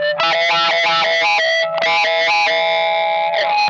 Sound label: OVER WAH, Funk Guitar; 90 s Sample CDs; Zero G